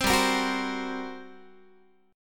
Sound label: G Minor Major 7th Double Flat 5th, G, Guitar; GmM7bb5; strummed